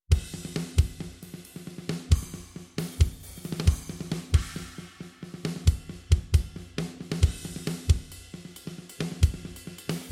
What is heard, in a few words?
drum only without; the origin drum kit; polarity audio